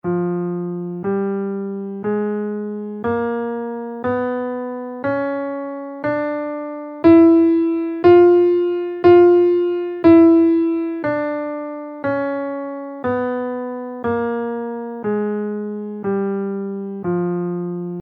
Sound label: whole half diminished scale in Fm, guitar